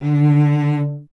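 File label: strings cello D; Miroslav Vitous; Solo Instruments VOL-1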